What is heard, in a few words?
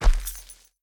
Footsteps Dirt Walk; The Town